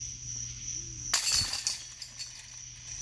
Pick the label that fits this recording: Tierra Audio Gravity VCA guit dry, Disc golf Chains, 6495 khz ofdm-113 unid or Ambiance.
Disc golf Chains